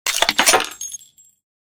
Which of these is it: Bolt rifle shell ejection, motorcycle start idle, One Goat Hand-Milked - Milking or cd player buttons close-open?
Bolt rifle shell ejection